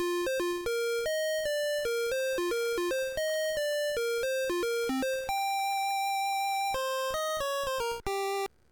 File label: lead